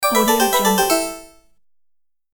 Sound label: martial arts return; sonic-boom